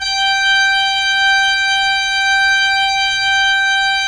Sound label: ORG P. ORG; Roland - LA Composer vol. 1